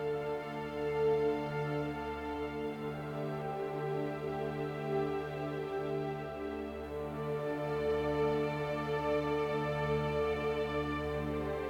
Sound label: Adagio Loop